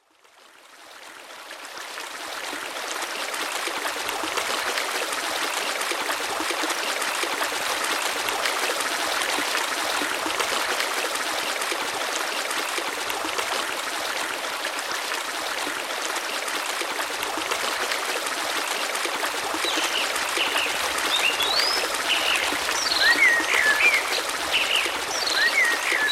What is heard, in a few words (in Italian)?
ruscello cinguettio naturale